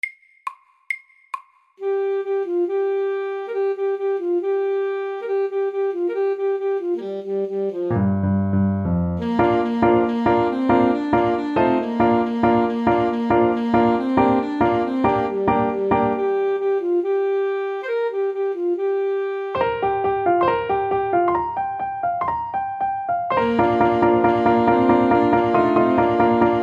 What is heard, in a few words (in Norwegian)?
Pentatonisk melodi fort